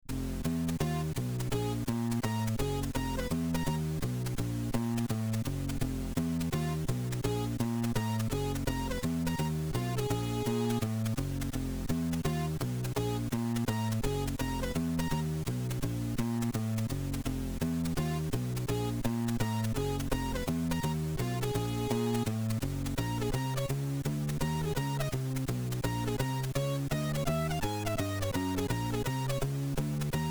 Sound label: Jazz; sid